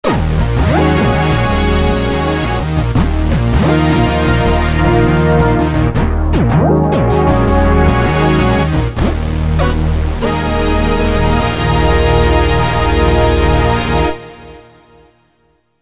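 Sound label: 4 Square PWM, V-Synth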